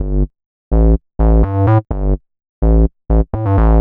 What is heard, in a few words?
126 G A BASS, Funky Underground bass loops; Loopmasters; Funky Underground Vol 2